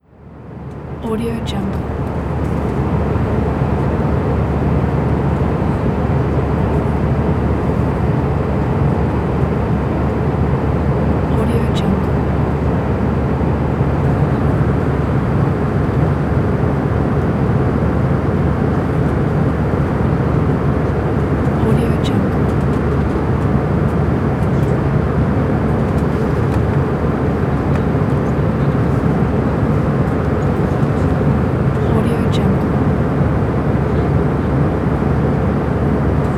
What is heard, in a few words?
ambience inside passenger jet